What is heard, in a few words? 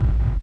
BD Gate FX, Bassdrums; Vengeance Samples